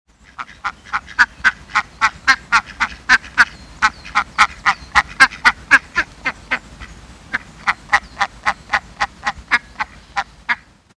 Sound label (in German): ente u erpel